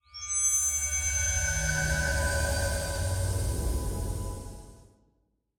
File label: Hero Resurrect Sym; arcane